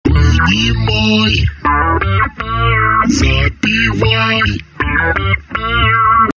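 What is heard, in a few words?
ringtone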